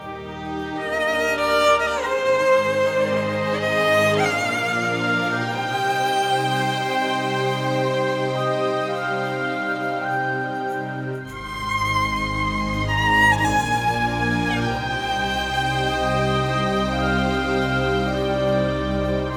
epic cinematic

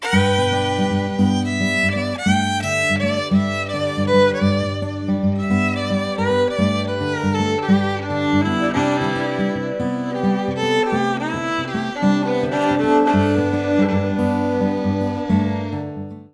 acoustic

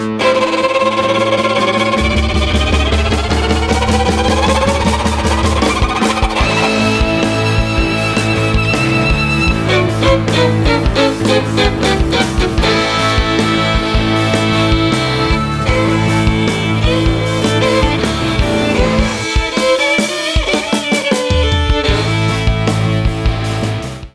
hard rock

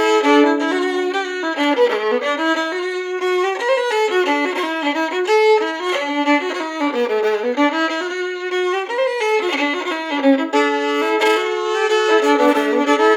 fiddle

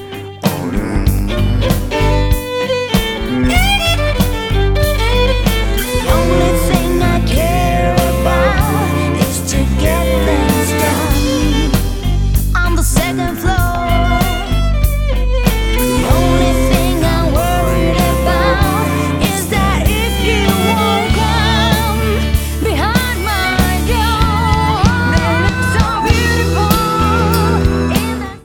soft rock

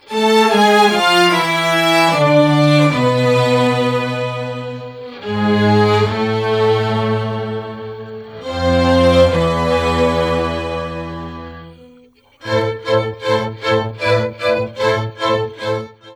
orchestral

